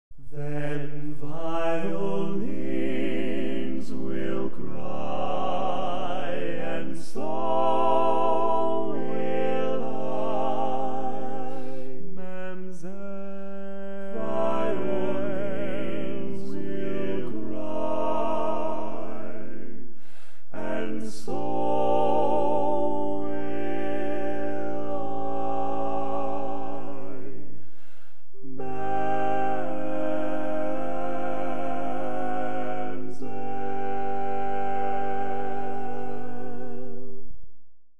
Bari, barbershop tags

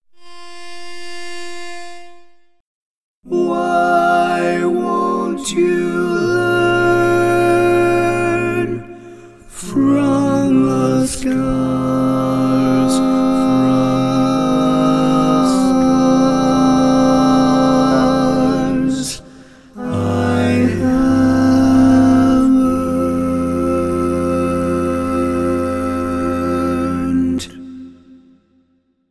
Tenor, barbershop tags

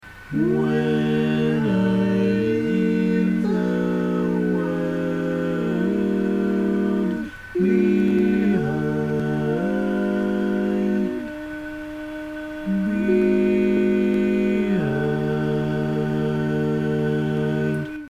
All Parts, barbershop tags

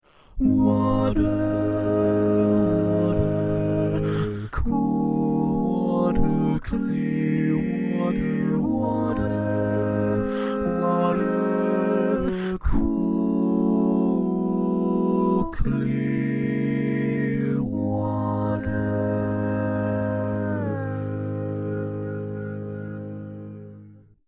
All Parts, barbershop tags

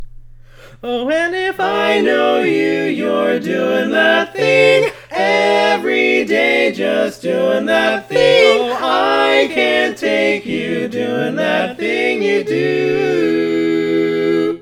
barbershop tags, all parts

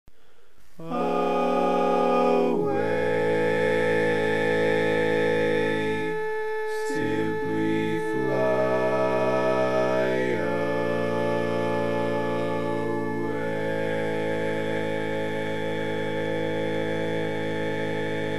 Tenor, barbershop tags

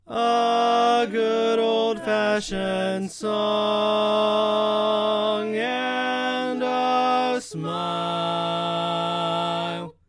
Bari, barbershop tags

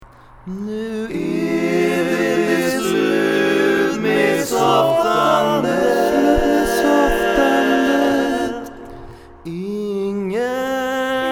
swedish lyrics, All Parts, barbershop tags

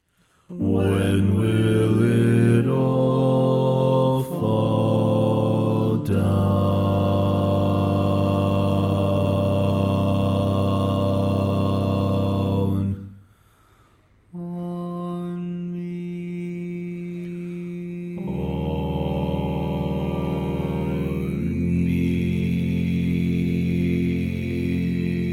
Bass

